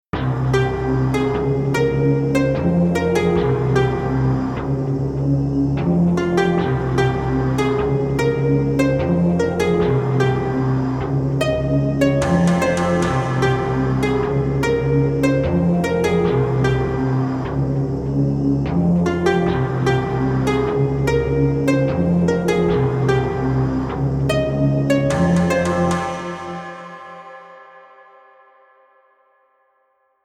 Hiphop Trap Melody 149 BPM

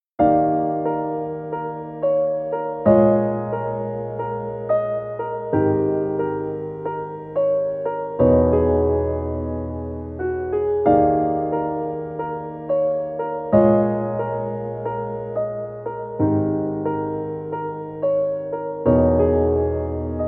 Comfort Shine 90 BPM; montage assorted melodies

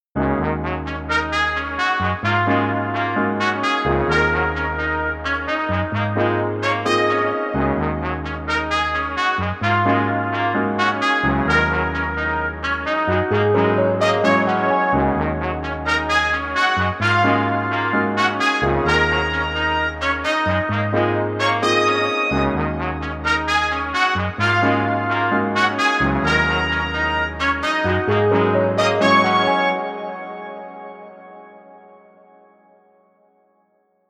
Melody 130 BPM; Vegas

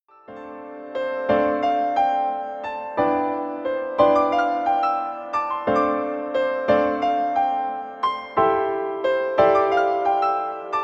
Inspirational Determined; standard pack 2

